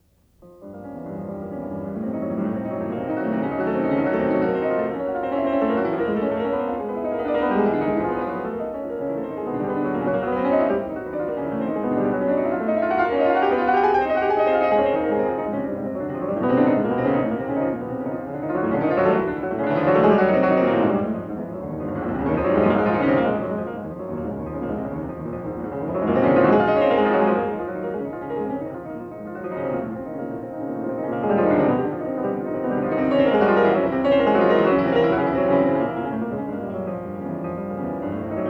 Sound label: Piano Sonata; Chopin